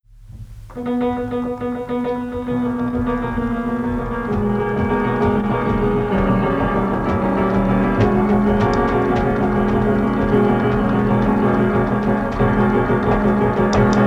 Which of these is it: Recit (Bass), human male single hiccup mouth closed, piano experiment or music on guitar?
piano experiment